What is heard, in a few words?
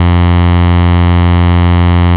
Saw Down